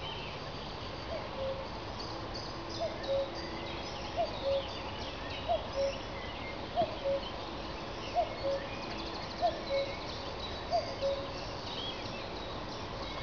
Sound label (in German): kuckuck, voegel; ddorf